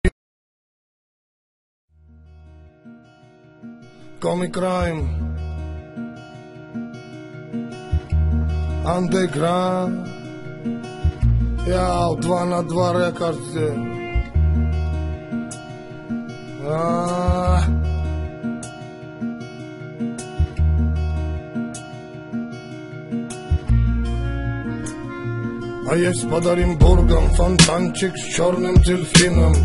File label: turkish music